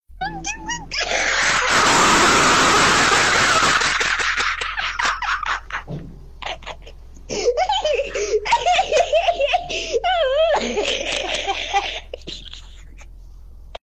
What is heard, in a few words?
kid laugh